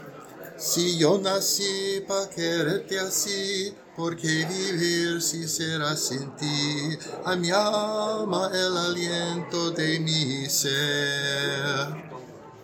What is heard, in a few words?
bass, chorus; di sapozkelekh